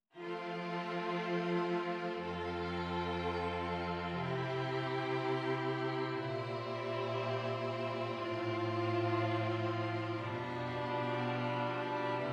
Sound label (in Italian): esercizi di contrappunto semplice A quattro voci; Ronge